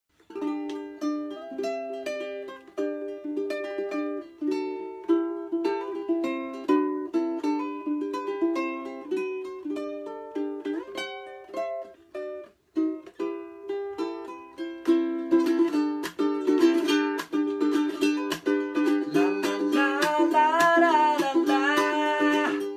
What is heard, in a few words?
Chord Melody, ukulele